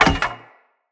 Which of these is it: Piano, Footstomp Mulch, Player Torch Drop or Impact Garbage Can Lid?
Player Torch Drop